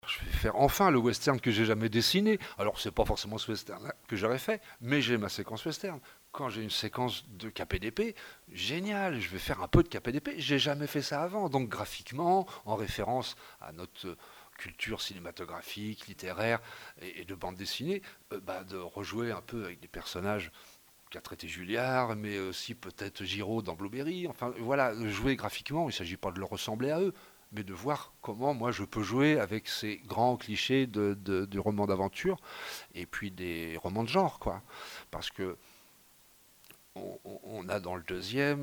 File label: riff sequences